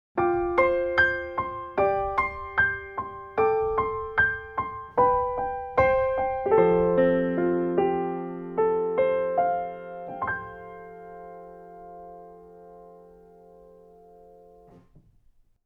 piano in blue

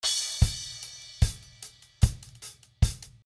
Drums HH

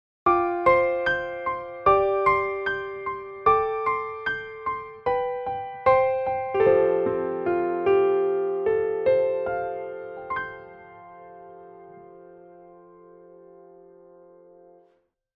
Concert Grand

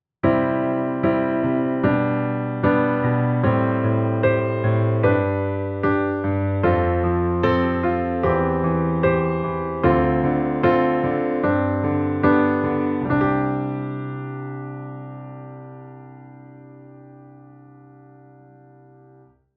Addictive keys

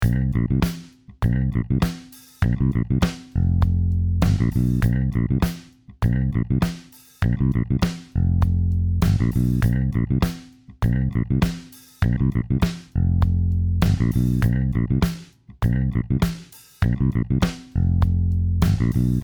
Trilian Funk

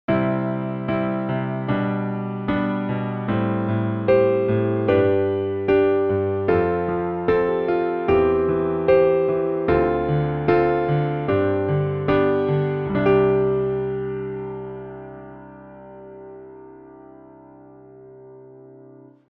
Concert Grand

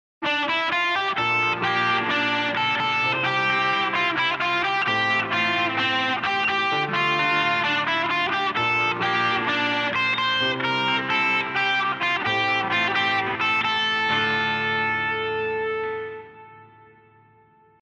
guitar Free Amp M Drive

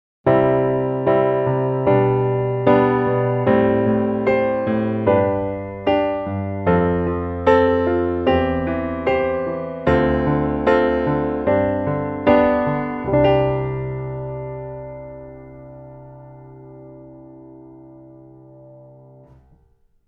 piano in blue

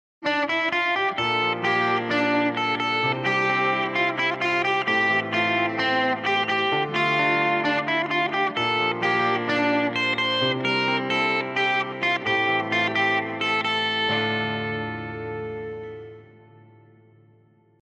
guitar Free Amp Drive

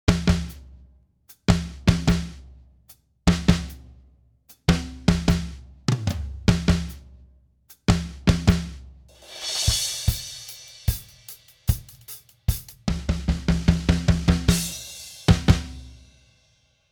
Drum intro

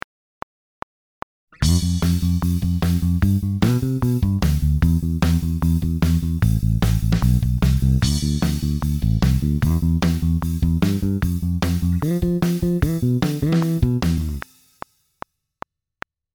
Anison

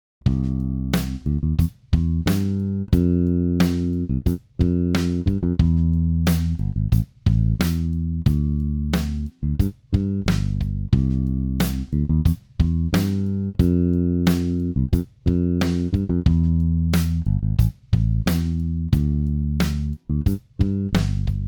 pops

